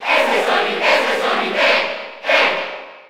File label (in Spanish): Cheer Spanish PAL; Sonic; SSB4